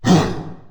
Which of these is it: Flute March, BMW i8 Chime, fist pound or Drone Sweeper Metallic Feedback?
fist pound